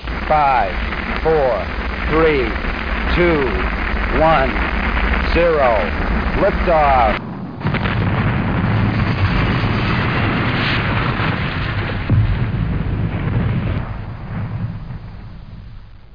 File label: liftoff